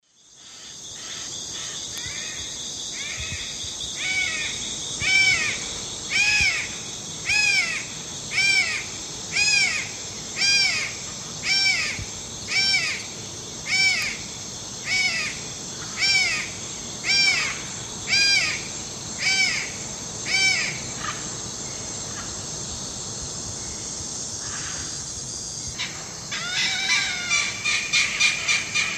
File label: Daptrius ater, calls; S. America; Eastern Lowlands